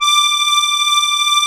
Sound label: STEIRI D 5, STEIRISCHE